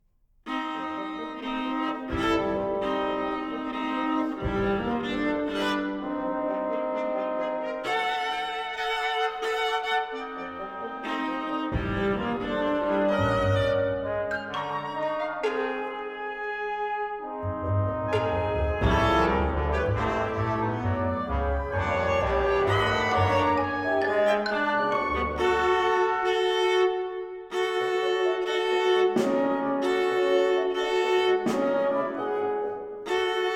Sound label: serenade for viola and chamber orchestra, scherzo